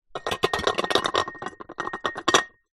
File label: household stacked crockery wobble movement